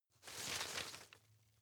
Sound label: shake medium, foerster; berufe